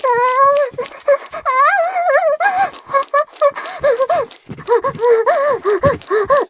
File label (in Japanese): 絶頂, ASMR; 騎乗位